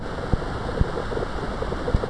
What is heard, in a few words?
EEG speech; ICAD2002